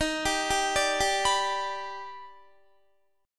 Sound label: Eb Augmented Major 7th Eb down Guitar; Eb+M7 arpeggiated; JGuitar